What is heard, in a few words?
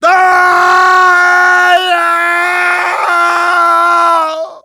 falling